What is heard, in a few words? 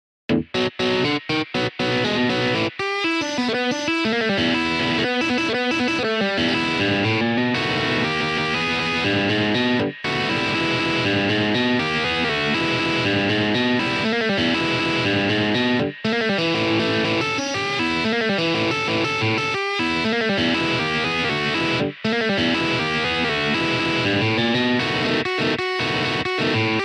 Bluesrock 12-Bar Blues Intro Verse